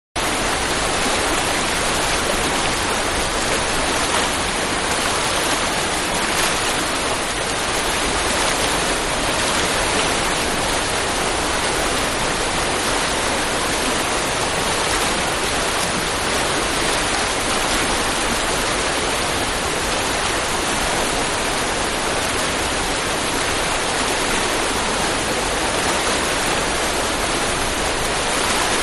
River Rushing Close; Rivers; Nature; Manhattan Production Music

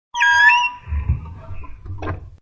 Creaky Door; Part of Creaky Doors (Sound Effects:Doors)

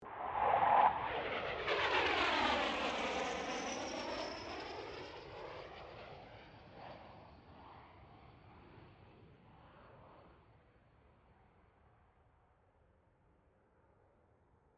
AIRCRAFT CA-27 SABRE PASS STEREO

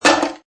Metal Can drop; Metallic; Metal sound 07 Can Drop 1; Multimedia

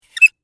Squeak Handle Turn, Single, Metal; Texture; Squeaks; BlastWave

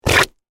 Suction Mud Fart, Texture, Suction, Mud, Fart; Water; Nature

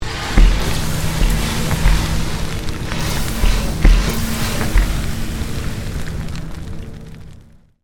Created Magic Spell Meteor Shower; Series of created spell sounds

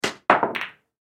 Pool Jump Ball; SoundEffects-Blastwave; Sports

